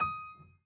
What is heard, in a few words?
piano lo